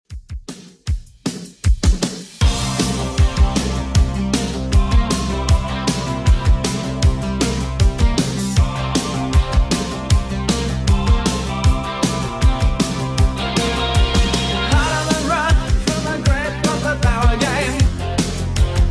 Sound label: 80s Redrum 156bpm